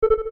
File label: hold fail; SKY IM-A730S-VEGA-S